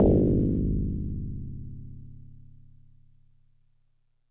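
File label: Tubular Bells DX100 C sharp 0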